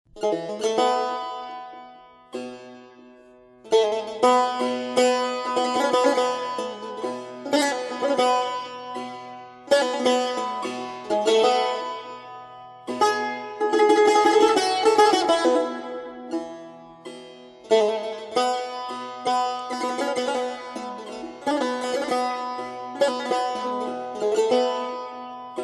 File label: Saz